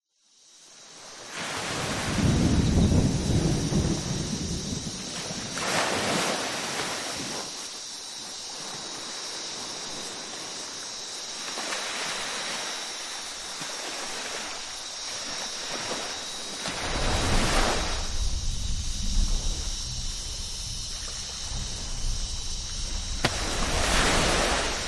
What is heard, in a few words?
nature ocean waves tropical beach distant thunderstorm insects